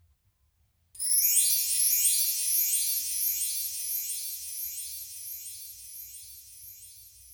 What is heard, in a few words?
Belltree Left Dly